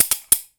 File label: Perc Rattle Single